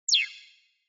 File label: Cyber